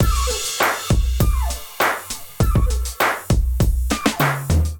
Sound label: Kit Melodic 100 bpm, Drums; MIDI Clips; Move Up; Ableton; Chop and Swing